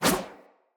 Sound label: Sword Whoosh; Weapons; Swords; Garren Sword